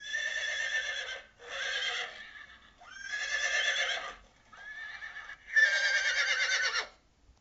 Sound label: Horses Whinnying